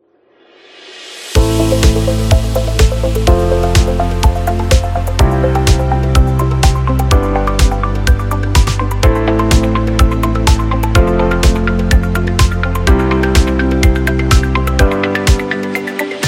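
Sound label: Classy, Modern lo-fi and retro background music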